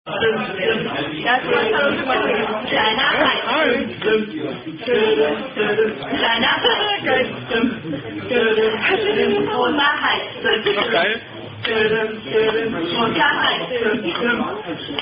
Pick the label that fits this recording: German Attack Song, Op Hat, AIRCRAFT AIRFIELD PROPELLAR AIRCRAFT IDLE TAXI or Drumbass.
German Attack Song